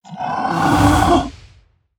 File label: Drake Death